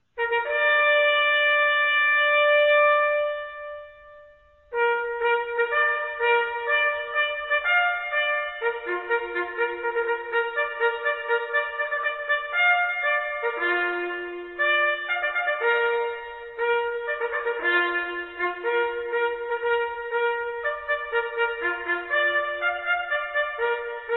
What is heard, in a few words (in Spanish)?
CORNETA retreta; Control nocturno